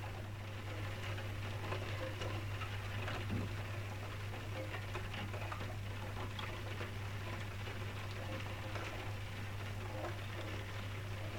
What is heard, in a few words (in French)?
pluie interieur